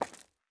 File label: Footstep Concrete Walking; Character Sounds